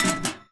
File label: Folder Closed; Salem's Sound Schemes